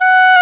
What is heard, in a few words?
WND CLAR, Clarinet Sh; Roland LCDP04 Orchestral Winds; 90 s Sample CDs